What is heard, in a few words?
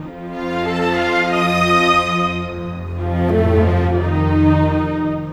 Cinematic Strings, Orchestral; Cinematic 06; Orchestral Series